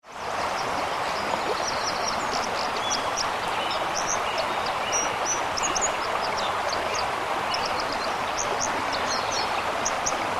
wasseramsel; Cinclus cinclus

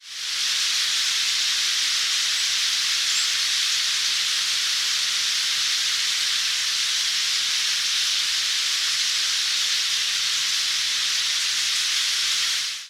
bergfinken

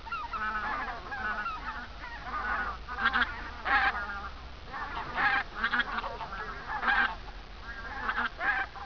gaense

